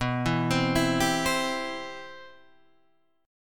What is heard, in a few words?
C Major B